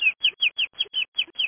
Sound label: chirp; amiga